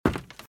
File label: Footsteps - Wood - Creaky - Run - Right